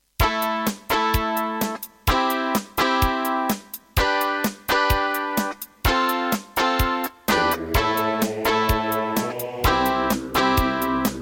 Korg X5DR; raptor call of the shadows

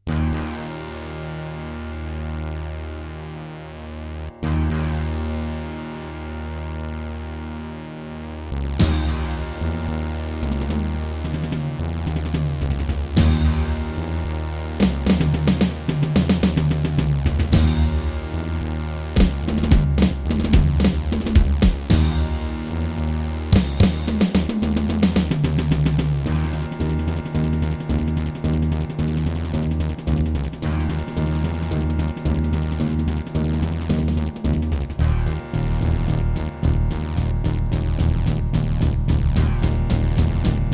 Terratec MiniWaveSystem WT64; Doom II

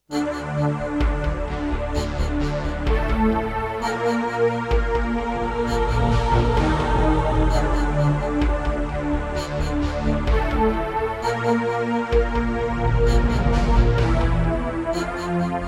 Serdaco Dreamblaster X2GS BURAN; Descent II

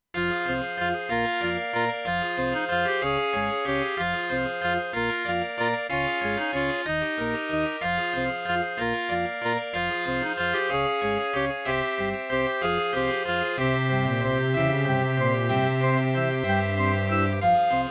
Ensoniq VIVO 90DB-A; Death Gate - Track 6

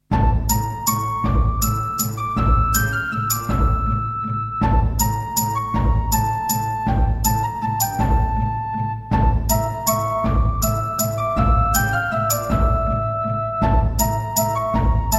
Terratec Wave XTable

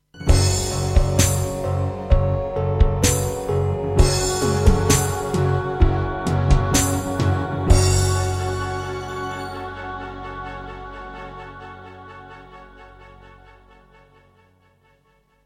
EMU Sound Engine; sigil doom episode 5

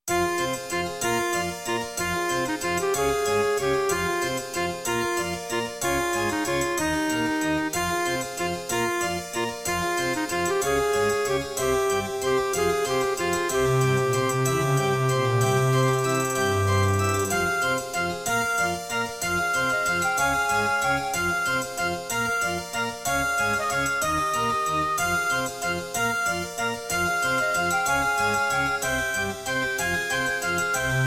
BCM DB2133a; Death Gate

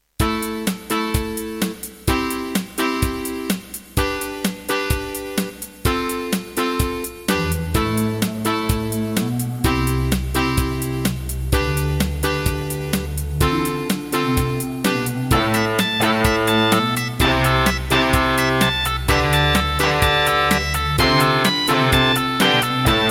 Casio GZ-50M; raptor call of the shadows

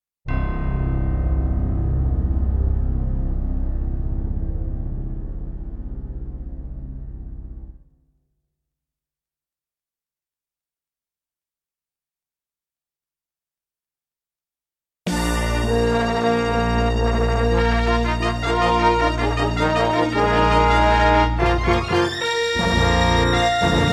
Intro, Roland SC-88ST Pro; Dark Forces

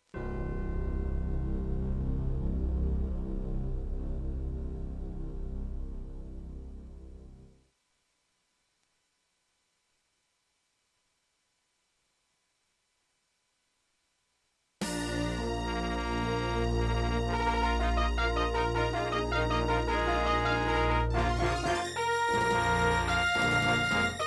Intro, Labway LWHA111800 (AdMos QS700); Dark Forces

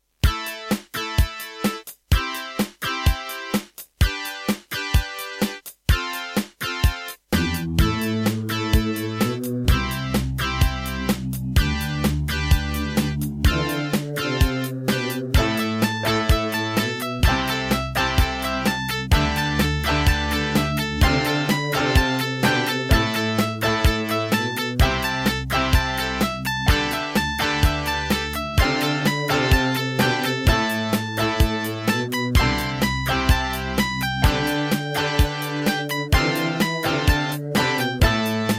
Serdaco McQub; raptor call of the shadows

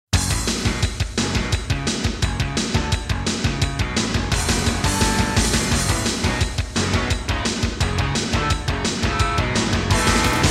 Intro, Hammond GM-1000; Duke Nukem 3D

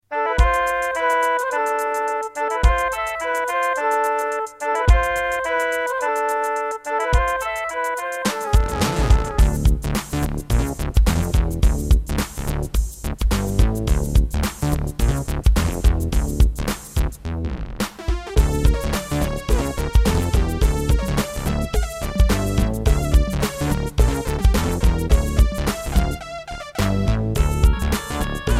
Egypt, Roland SC-8820; 55MAP; Lost Vikings

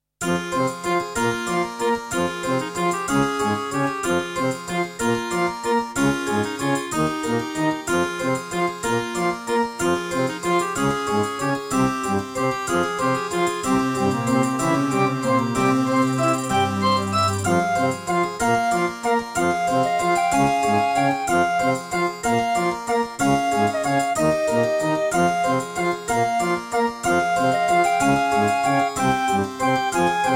Track 6, Yamaha TG100; Death Gate

